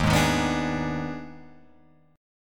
D sharp Major 7th Suspended 4th Sharp 5th, down Guitar Open F; strummed; D#M7sus4#5; JGuitar